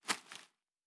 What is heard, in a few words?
Leaf Walk Surface, Footsteps; Survival Game Sounds - Lite Edition